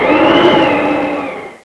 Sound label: PANEL; Games